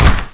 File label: car door; pygame